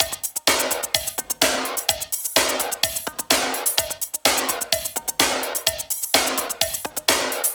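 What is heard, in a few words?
Percussion, Drum Loops 127bpm; Zero-G - Soundclash Nu Dance Electro; Thriller Killer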